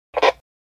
CB radio walkie talkie static burst squelch and noise